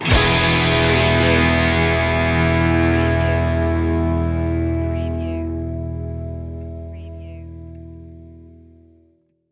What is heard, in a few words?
driving fun stinger